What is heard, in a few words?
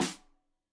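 Snare; Deeply Sampled Drums; Stereo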